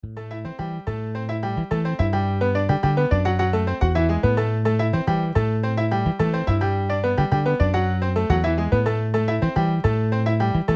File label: Piano Bass MIDI